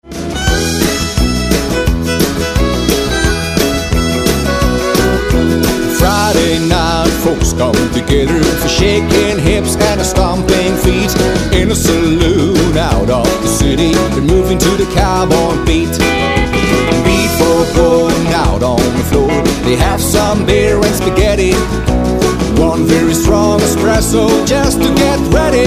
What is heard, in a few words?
italian line dance crowd